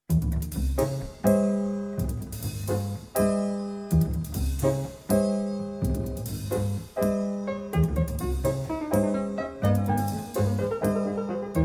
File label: Concerto for 2 pianos in C minor Allegro